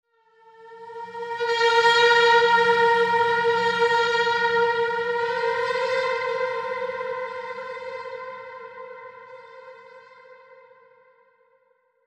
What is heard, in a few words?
Wormhole Kazoo Bend